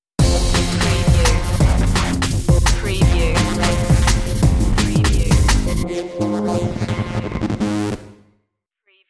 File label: high contrast stinger